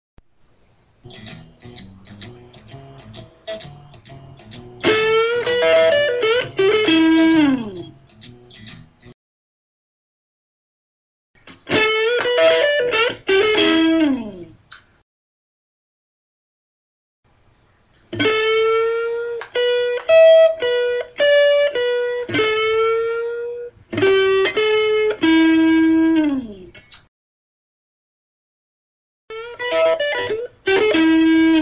style lick, guitar

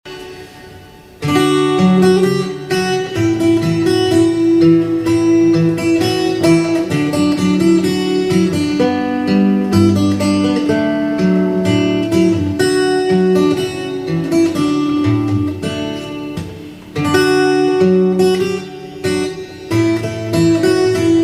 i shall not be moved fingerstyle

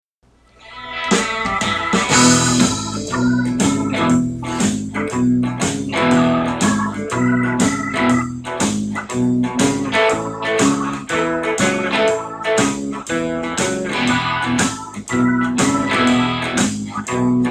rhythm guitar

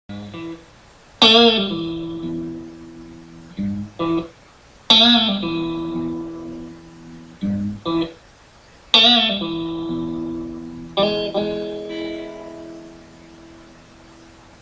style guitar lick

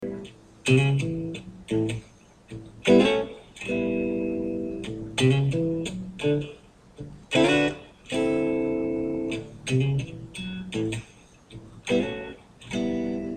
slow blues guitar lesson

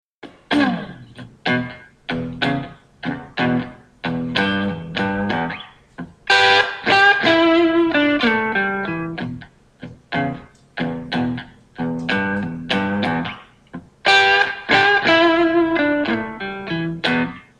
blues rhythm lead guitar

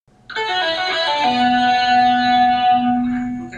descending pulloff, guitar

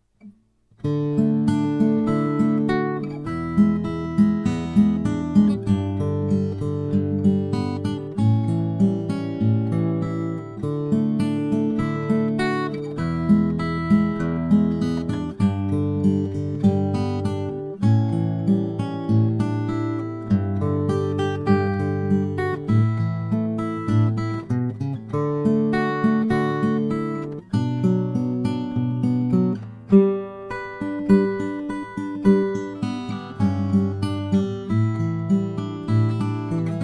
fingerstyle

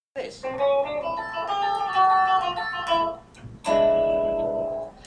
two cool turnarounds

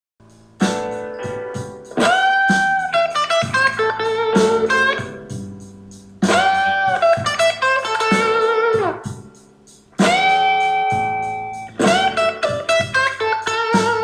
blues lick

